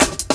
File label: Live Wire Cut, Drum Loops, 168.5, Jungle; Zero-G Jungle Warfare 1, 2 & 3